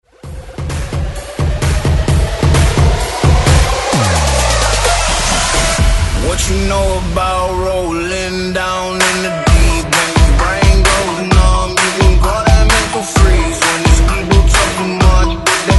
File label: JUNGLE DUTCH RMX 130 BPM